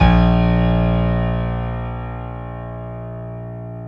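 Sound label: dance piano A#0; Best Service Advanced Media Trax Modern Composer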